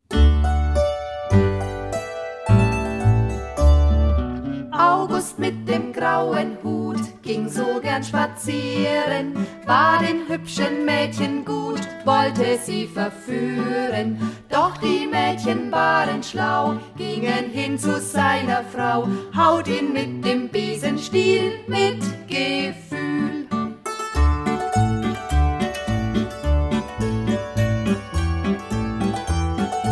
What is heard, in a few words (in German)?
Schottisch